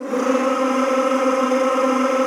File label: C3-R, Extended Classical Choir; Best Service